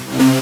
Basses Root C

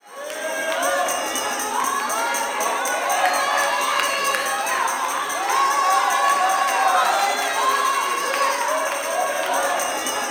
WORSHIP, WORSHIPAMB, Vocal Planet, Gospel

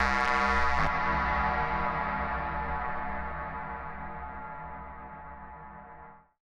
Juno Stab C1, Grime, 138bpm; Zero-G Sound Sense Grime Scene; Construction Kits; Da Suspects

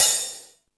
More Cymbals, E-MU Pro-Cussion

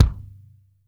HIT THIN, KICK DRUM; Sampleheads; New York City Drumworks VOL-1

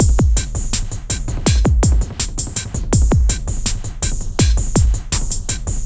Processed Beat, Drums & Percussion

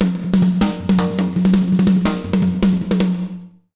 BEAT, Jazz Latin Drumloops; USB Soundscan vol.08; AKAI

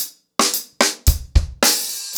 110BPM, ACOUSTIC DRUMS

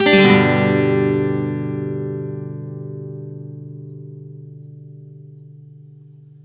One Shot G upstroke, Acoustic Guitar; One Shot Chords; Sonic Foundry (Sony Creative Software); Songwriter Acoustic Guitar Companion

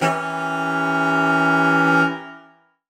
Horn Swell Alt Edim; undercover samples

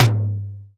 Percussions

